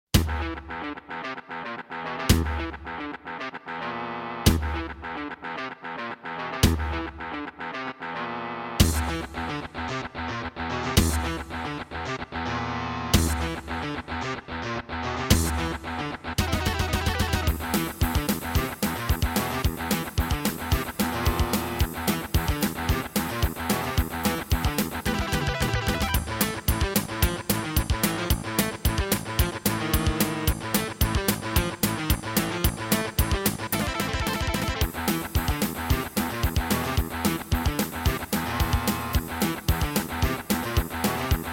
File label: Roland SC-7; Doom - E1M1